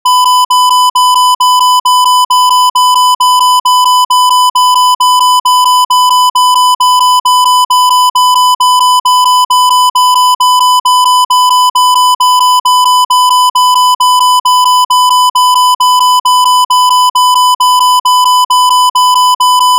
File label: Calibration signal loop